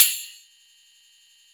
Springy Splash

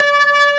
B3 Lo Dist Slow C4

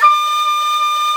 Shakuhachi G4

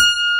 CP70 A4

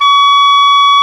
English Horn F4

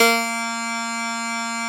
Piano D1

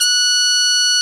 Harmon Mute A#4

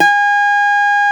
N Guitar C4

